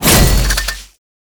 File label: Void Dragon Grapple Connect; Enemies; Gameplay; Warframe; Duviri